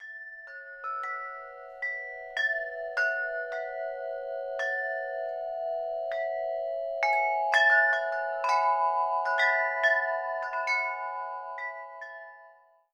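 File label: ALUMINIUM, WIND CHIMES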